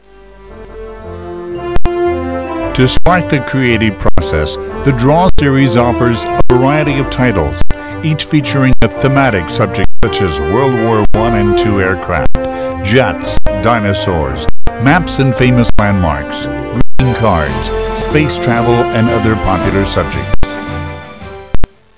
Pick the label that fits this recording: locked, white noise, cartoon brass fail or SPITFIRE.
SPITFIRE